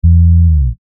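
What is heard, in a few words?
Musical Element, Drum Snare March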